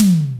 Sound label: BIG TOM; Pro-Rec - Synthsonic; TRINITY